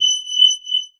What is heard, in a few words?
Multimedia Internet CD-Rom Flash Beep Multiple